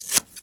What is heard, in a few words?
Cutting Paper; Realme 8